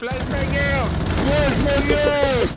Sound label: flashbang out